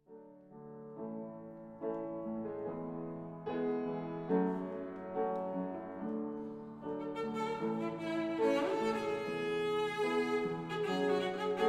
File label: Cello Piano